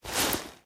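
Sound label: inventory items cloth; STALKER; IWP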